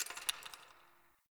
Ice Crack; Warframe; Nineteen Ninety Nine

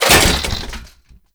Cash Register Destroy; Warframe; Ambience; NineteenNinetyNine

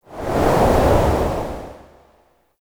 Conservation Cleanse; Warframe; Duviri

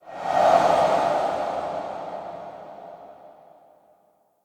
Museum Pedestal Light Whoosh; Ambience; Vitruvian Museum

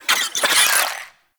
Infested Ashen Vocal Attack; Warframe

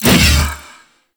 Big Slash Whoosh

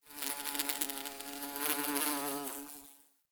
Bee; Warframe; Ambience; Nineteen Ninety Nine

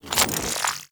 Spear Throw Charge; Warframe; Corpus; Spear Gun

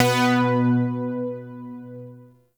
Shawzin Oct One B; Music; Warframe; Zariman

